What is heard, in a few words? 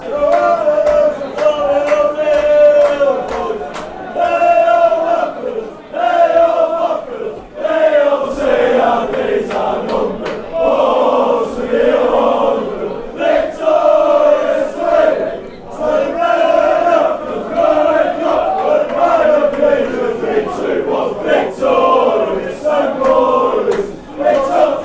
bolton chant